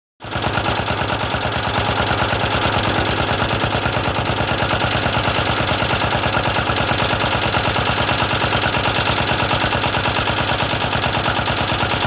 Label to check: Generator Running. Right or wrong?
Right